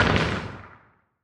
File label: asteroid damage interstellar medium; Factorio